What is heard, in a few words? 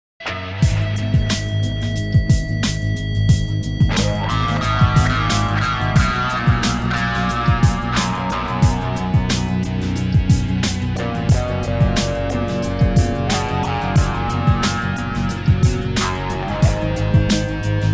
Gretsch